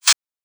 Hi-Hats G

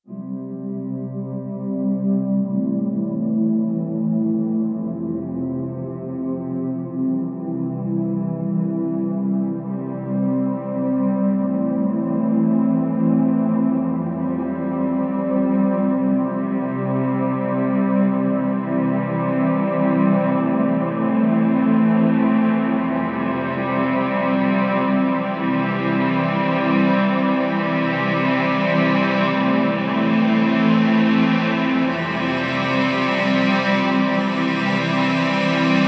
Strings, 107, Amin, Wet

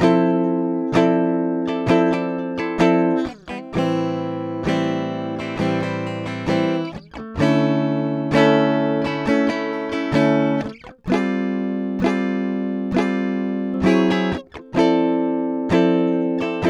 guitar loop unprocessed 130 A; control

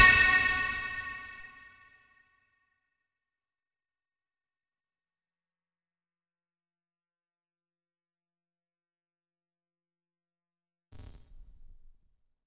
Vocoder Lead 88 F-

